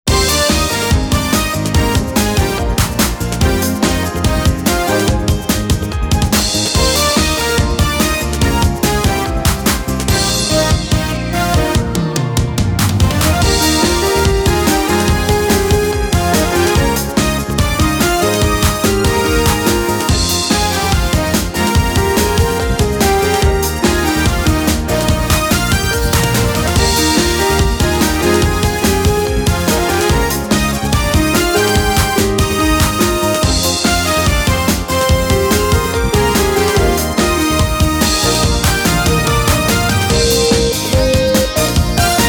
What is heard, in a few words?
Fly High; hotchkiss original soundtrack sweet kiss